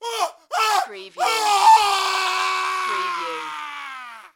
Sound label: Scream Male